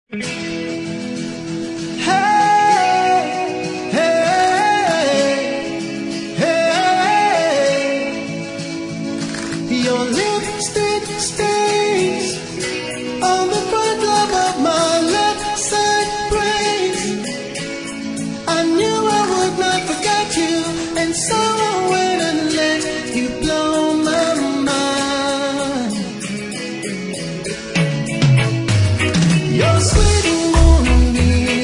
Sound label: Reggae Cover